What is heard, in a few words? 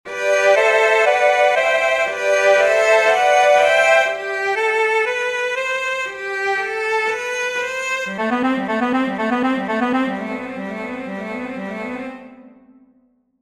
Legato Test Cello